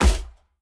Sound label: armor impact; smite; lancelot